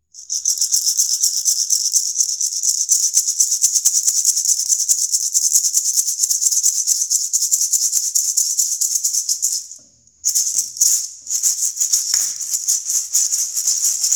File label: Vocal maracas; vie de l'ecole